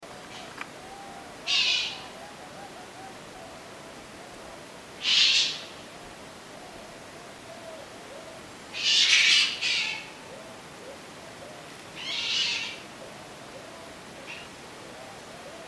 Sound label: Mongoose Sound